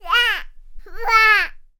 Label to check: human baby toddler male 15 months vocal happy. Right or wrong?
Right